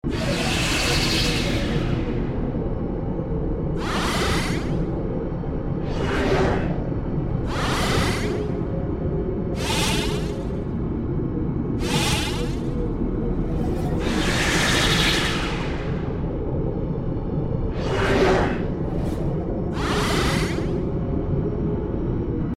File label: Background Loop; RussianRoulette